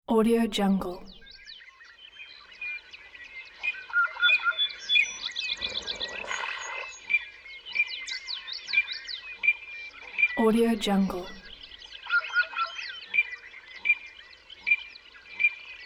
birds

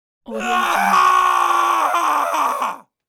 male scream in fear

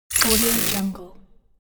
glitch sound effect

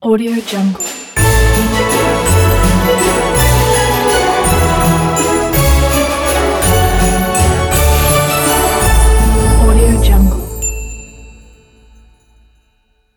christmas logo

